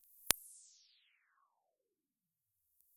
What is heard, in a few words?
effectron Imp Resp